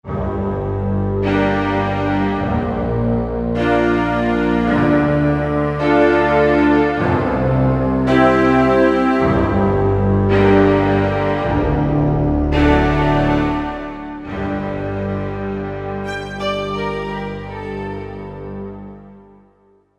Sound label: ORKA STRAW, Korg MSC-11S Brass